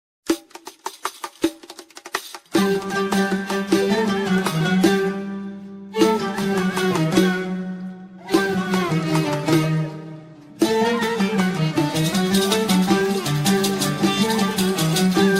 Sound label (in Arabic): موشح اندلسي Andalusian Arabic